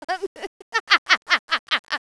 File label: Laser Lab Sound